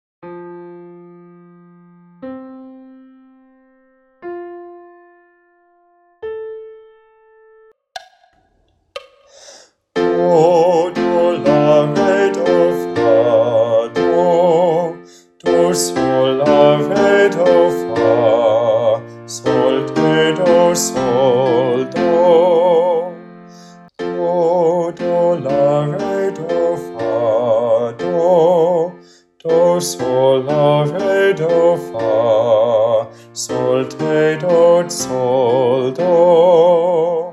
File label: BASS Solfege, Solfege with Singers; O Occhi Manza Mia